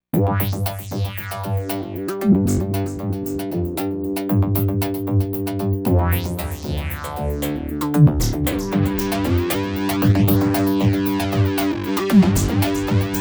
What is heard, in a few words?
synth; v synth xt cyklotronik